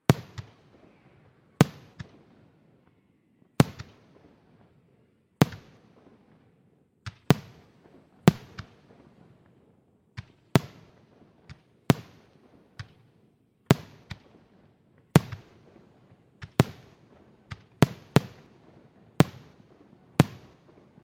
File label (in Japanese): 花火の音; firework